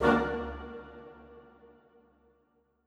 Woodwind Ensemble Hit

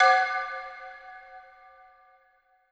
Xylophone Hit

